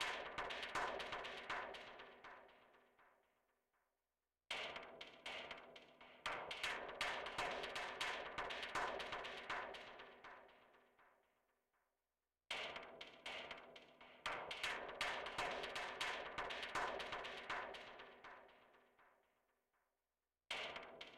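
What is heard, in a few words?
Percussion; Worms Rumble; PS4 PS5 Switch Windows Xbox One Xbox Series XS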